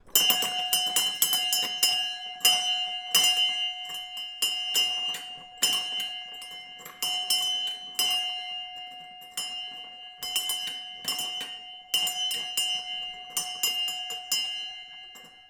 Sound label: Harbor Bell